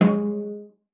CELLO FINGER G#3; Xsample VOL-5

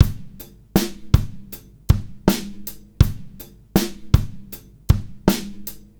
Live Beats, R&B Drums

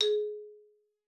XYMF G#3, XYLOPHONE; Xsample VOL-4

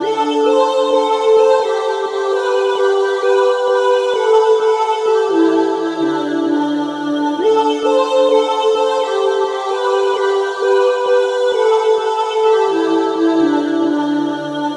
Pad, Trance

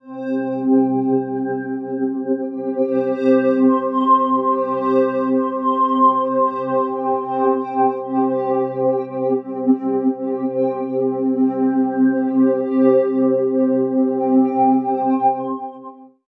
murmurs C G; Zero-G Ambiosis; Light

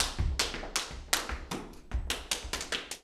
Flamenco, Tapping, Zapateado Solo; ProSamples vol.46 - Flamenco

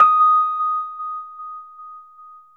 Rhodes 73 D#; E-MU Producer Series Vol. 5 – 3-D Audio Collection; 3DRhodes

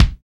BD, Roland; Sound & Vision - Gigapack I

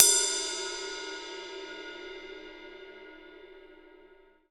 RIDE20 EDG, Drums; Big Fish Audio; Ross Garfield Drums VOL-2; TOPEKA KIT

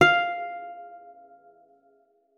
F5, GUITAR; Xsample VOL-2

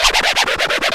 FX SCRAT, Scratchin; Club-50 - Foundations Roland; Scratch 1 S

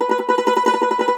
Cumbus SAZ B, Plucked Strings; E-MU Producer Series Vol. 7 – Old World Instruments

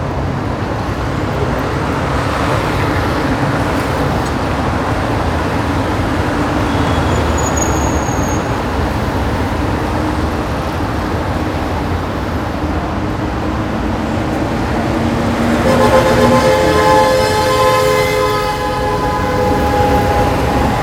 city ambience; E-MU Producer Series Vol. 3 – Hollywood Sound Effects; Ambient Sounds

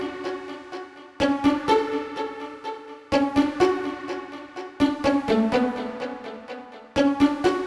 Pizz, 125bpm Bm; Zero-G; Nu Disco; Construction Kits; Fashion Week

